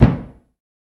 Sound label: Tub Hammer Hit Dampe; hammers